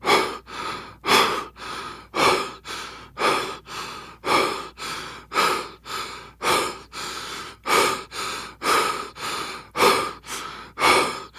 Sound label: Breath Running Loop; Kahl Mission; Warframe; Veilbreaker; Kahl Barks